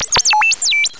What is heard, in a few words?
robot